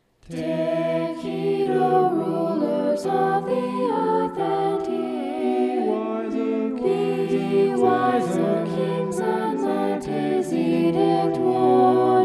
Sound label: vocal poly, Em; psalm 2